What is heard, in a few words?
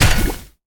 auto hit; smite; vulcan